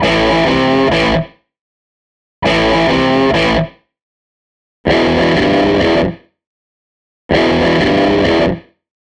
SG Guitar Loop

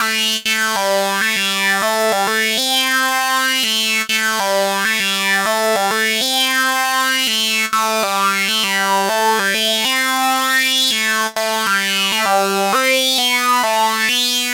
funk, riffs & solos; Dr. Fink's Funk Factory

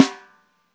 LOFI, snares; Spectrasonics; Burning Grooves

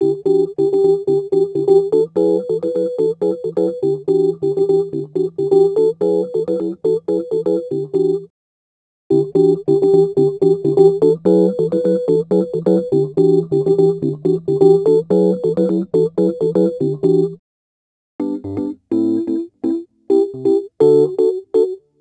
Rhodes Attacks; Ueberschall

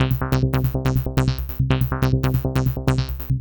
Synth; Sonic Foundry (Sony Creative Software); Prototechno; Synths

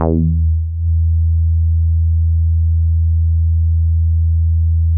bass, synths

